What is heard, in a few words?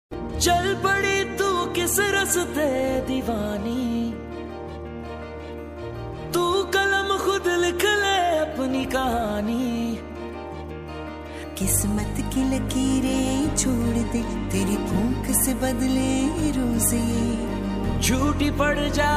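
original soundtrack pakistani ringtone; Sinf E Ahan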